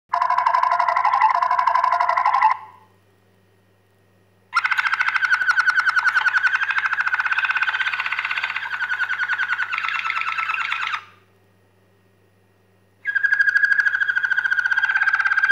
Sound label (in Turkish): Tjokken roll, Malinua Kanaryası; Hıçkırık benzeri bu ötüşler, usta kuşlarda güçlü şekilde duyulur; Zor bir nağmedir ve ustalık göstergesidir